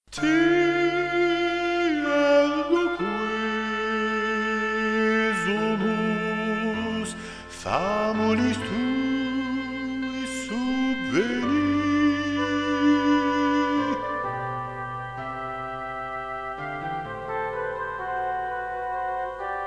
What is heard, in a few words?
Te Deum; Charpentier